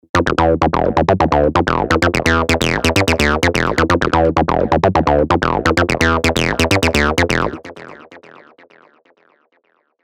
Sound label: Acid Loop E 128 BPM; Spire; Chill Pill; ACIDVSLASERS1